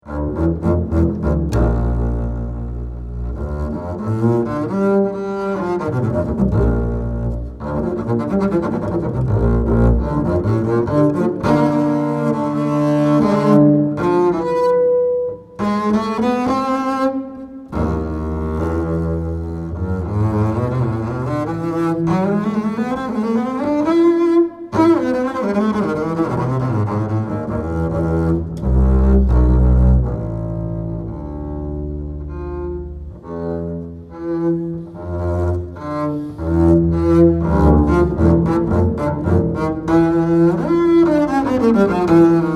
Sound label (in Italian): CONTRABBASSO